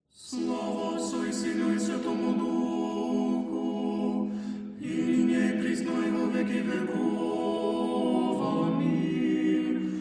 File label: Lesser Znamenny Chant, Tone 6; Only Begotten Son